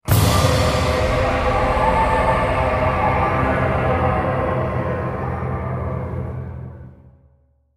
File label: Combat Heavy Outro; Darksiders